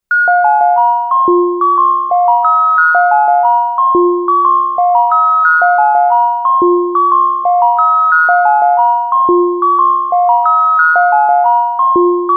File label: JU-06 selfoscillating